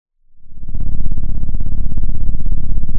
Sound of an engine revving

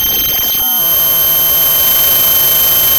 Sound of a cat meowing

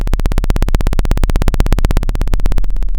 Sound of a plane taking off

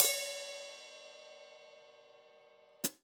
Hats Open, drum

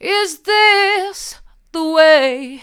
hard, vocal

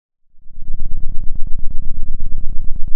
Sound of a thunderstorm, center

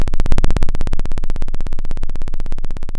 Sound of a plane taking off

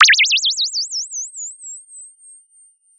Sound of a bird tweeting nicely

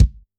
Kick, drum

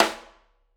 Piccolo, drum

